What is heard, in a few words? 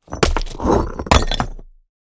Bricks dropped on a pile of stones with debris